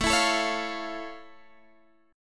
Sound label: F Augmented Major 7th, chord